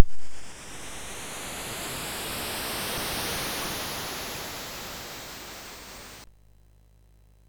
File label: sid MOS6581R2; California Games